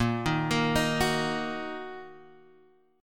Bb Suspended 4th Sharp 5th Bb down Guitar Half Step Down; Bbsus4#5